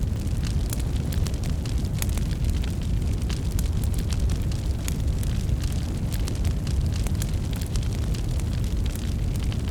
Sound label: Ambiance Fire Big Loop Mono; Nature; Essentials Series NOX SOUND